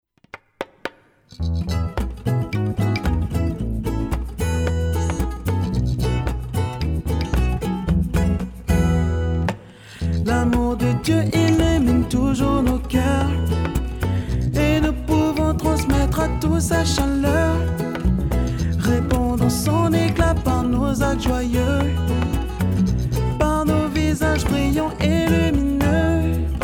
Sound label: Song, FR